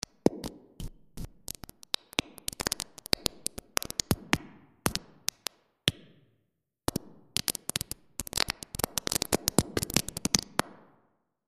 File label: large room dark, freeverb; .mp3 file - 100% wet